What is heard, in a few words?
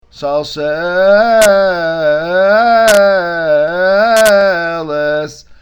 shashelet, cantilation